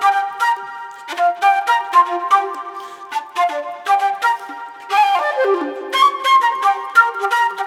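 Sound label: flute, disco; Best Service ProSamples vol.48 - Disco Fever; AKAI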